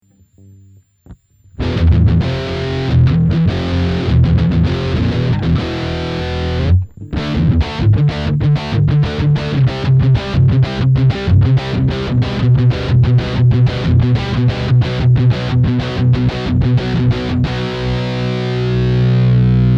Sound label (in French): Riff gain au max, ampli guitare; Amplug Classic